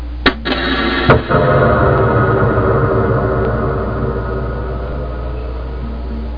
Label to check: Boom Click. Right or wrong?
Right